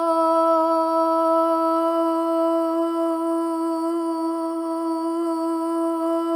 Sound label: solo boy; Spectrasonics; Symphony of Voices